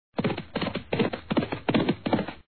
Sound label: Gallop; horsey racing